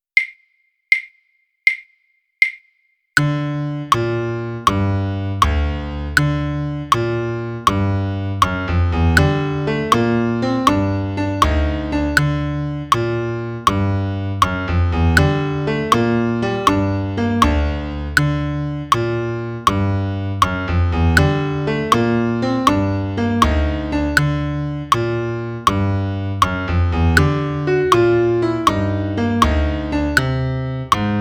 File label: piano click; slon; elephant